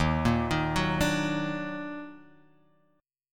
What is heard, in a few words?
D sharp Minor Major 7th D sharp down; arpeggiated